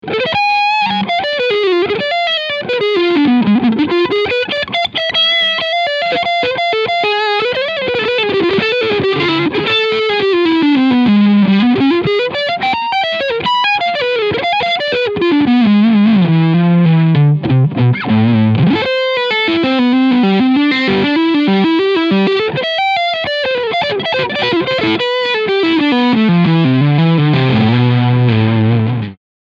Fender American Ultra Stratocaster Overdrive Front Pickup